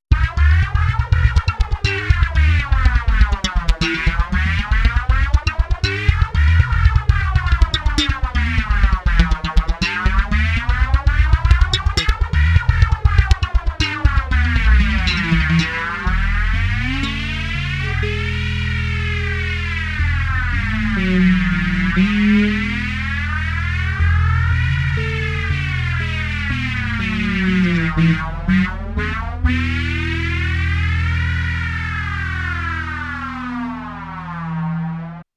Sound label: Maxon VJR9 slap